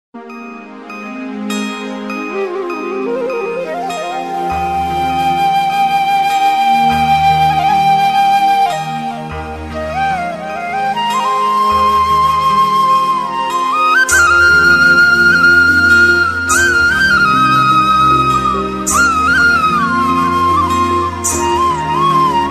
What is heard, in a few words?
flute of krishna, ringtone